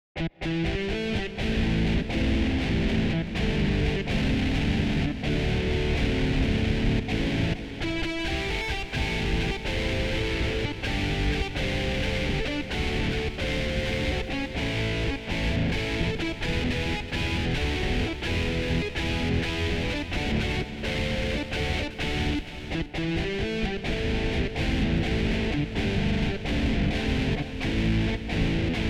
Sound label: Instr Guitar